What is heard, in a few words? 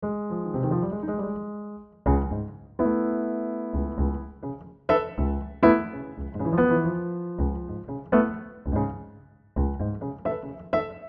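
Reverb Wide and Blue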